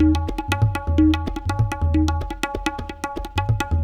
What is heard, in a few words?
PERCLOOP ETHNO 125; eJay